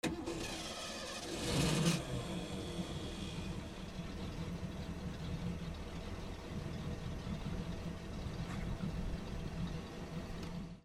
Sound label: BOATS